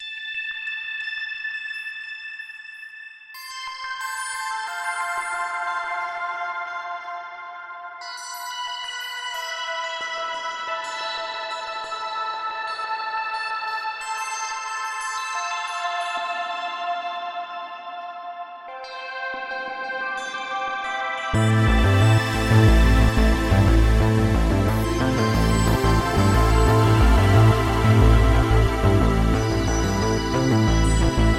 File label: microfreak